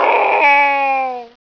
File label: ooohhhhh, yells